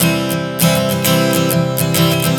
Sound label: Strum 100 C, One Chord Strumming 100 bpm; Acoustic Guitar